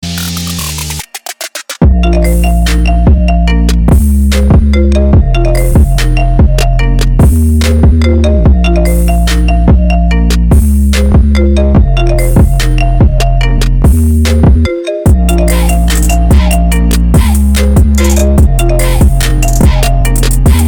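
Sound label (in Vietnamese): nhạc chuông điện thoại apple iphone tone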